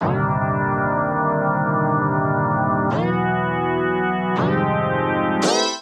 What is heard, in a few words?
Fm Chord C Major 165 bpm; MIDI Clips; Tonal; Synth; Ableton; Skitter and Step